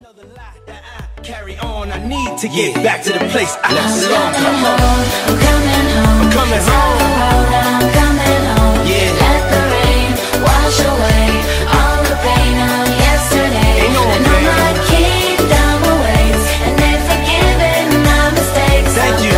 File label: English Ringtone